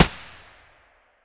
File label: aps muzzle distant; STALKER; IWP